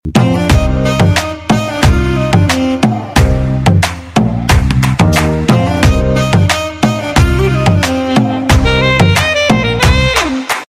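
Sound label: Sms Saxophone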